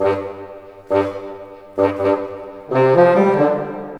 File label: BOLE, ORC Bolero; East West - Scoring Tools